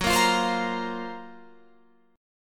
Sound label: F sharp Major Sharp 11th, Guitar, Open F; F#M#11 strummed; 18,17,17,19,20,17; chord shape